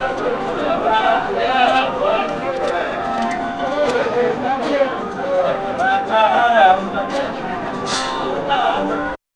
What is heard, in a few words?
hookah lounge ambience in, arabic